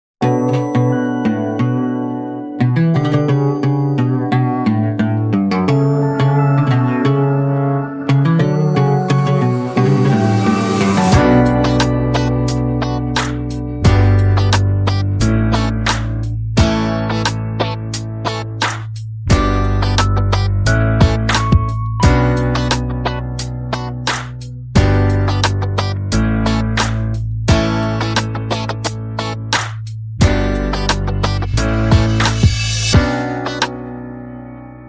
Guitar Version

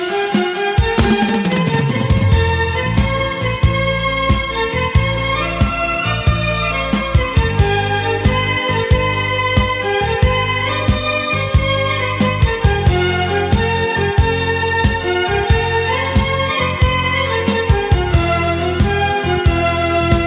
Keyboard, play back

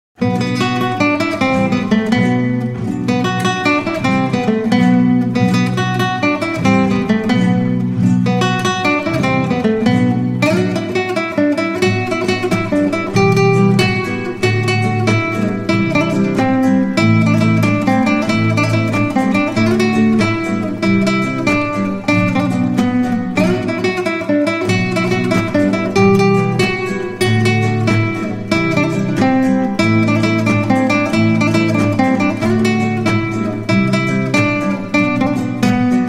Guitar

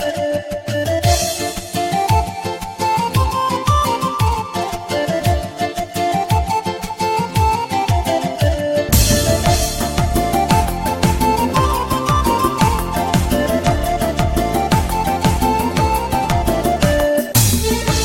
Accordion

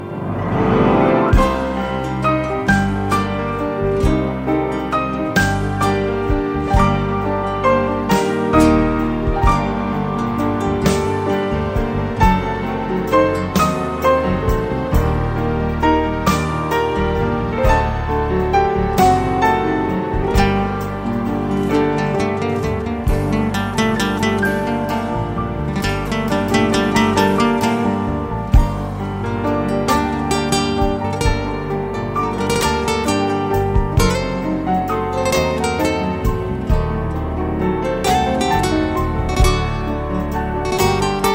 Guitar

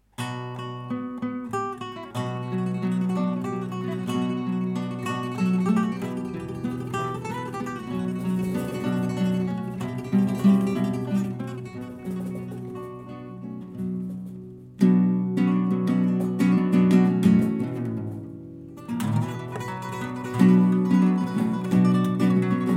Guitar

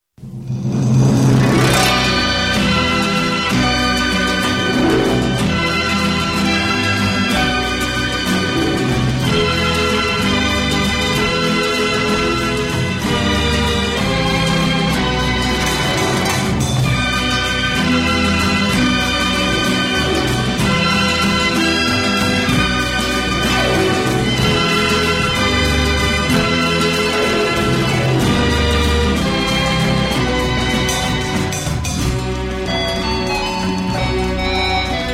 Melody

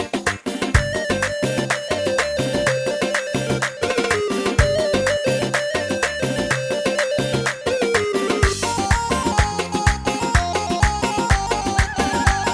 Melody

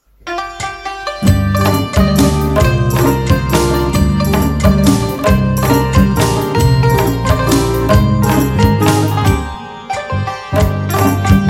Santur

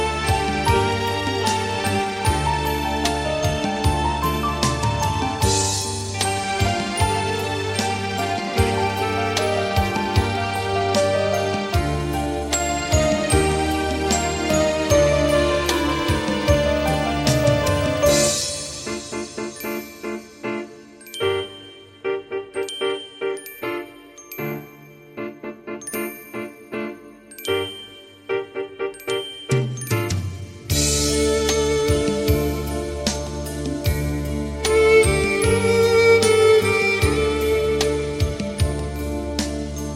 Violin

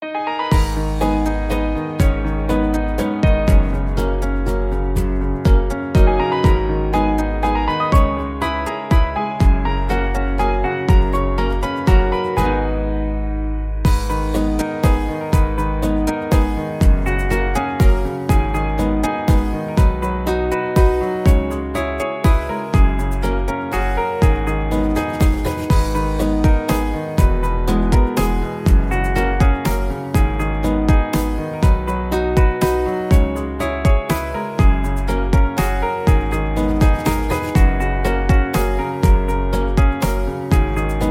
Melody

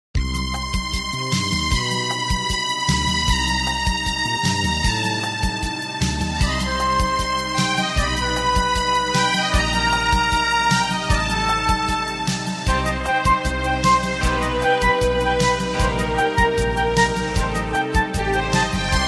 Saxophone

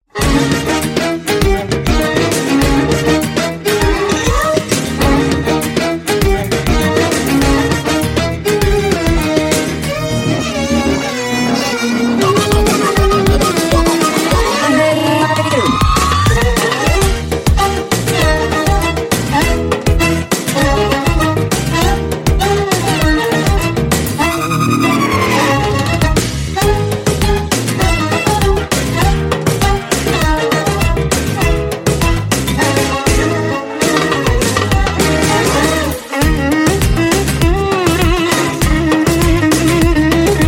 Violin

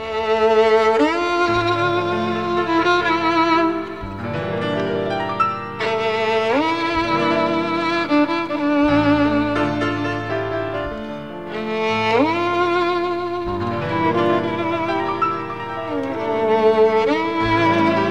Violin

